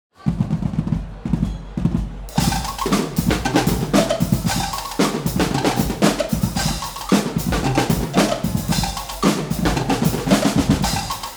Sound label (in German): Rhythmus; Trump